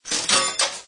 Slot Stop Once; BingoGrassland

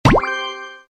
match; RedHotRoast; GemCrushAssets

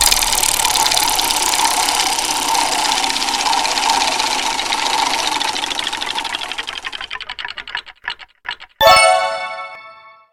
wheel lucky spin; BingoGrassland

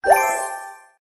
prop daub; BingoGrassland

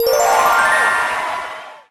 reward Show; BingoGrassland

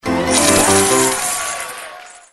reward show; BallCrushBest; LoveLegendAssets

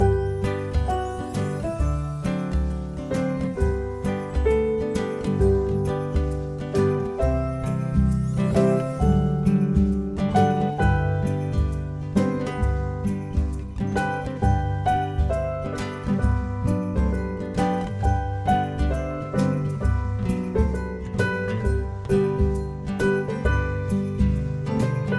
bgm MainScene; BingoGrassland

